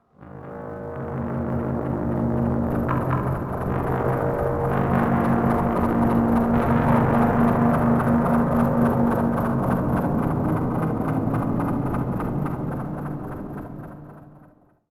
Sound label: Scary Amb Stinger